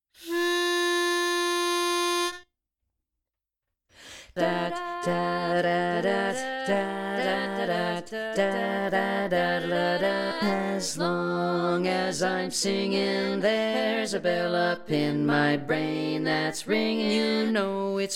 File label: bass predominant